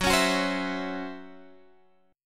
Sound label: C sharp Minor Major 7th Double Flat 5th F sharp, chord